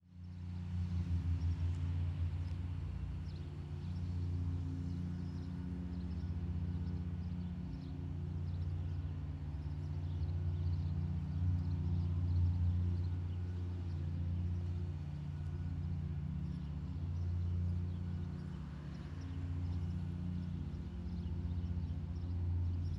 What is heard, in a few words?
Ambience Subdivision Distant Lawnmower; AdobeCC